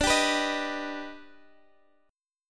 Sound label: D sharp Augmented Major 7th D, chord